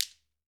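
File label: GHANA shaker, African Perc; E-MU Formula 4000 Series Vol. 4 – Earth Tones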